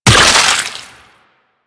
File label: Impact Gore Large; Warframe; Weapons; Bullet Impacts